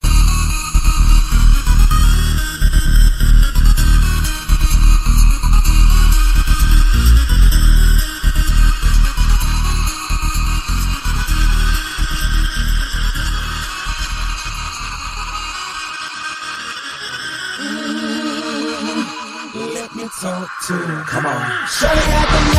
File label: Clubstep mashup